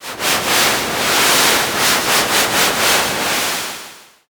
river

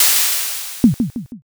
airlock

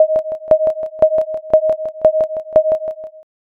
railroad

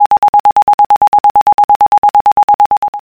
trainbell

